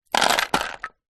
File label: jewelry and small makeup items place into wood container